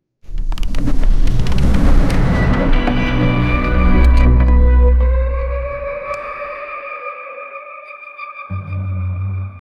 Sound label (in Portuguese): uma msica amendrontar, boss